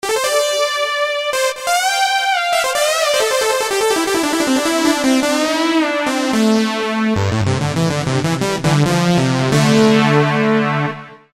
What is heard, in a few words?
Saw lead